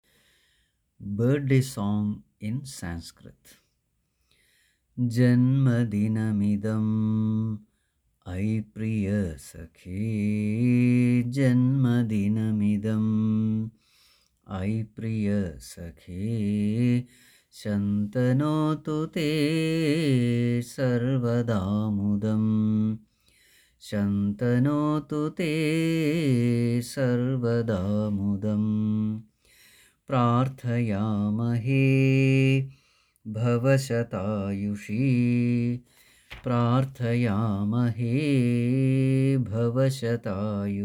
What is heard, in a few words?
Birthday Song